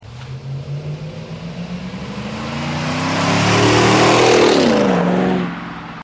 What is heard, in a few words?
escalade yukondenali sport exterior drive by, exhaust systems; corsa